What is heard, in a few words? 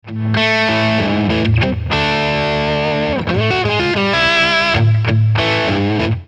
Overdrive, Cyber Deluxe